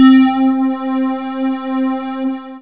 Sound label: SPACE POLY, SYNTH FUNK; Zero-G; Funky Elements